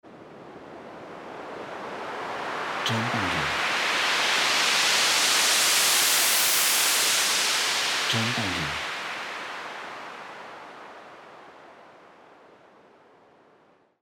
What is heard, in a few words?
Swooshing Wind